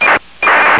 acars, scanner

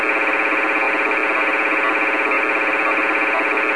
edacs nb, scanner

